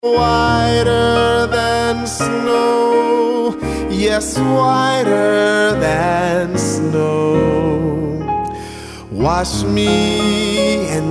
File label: Whiter Than Snow ring tone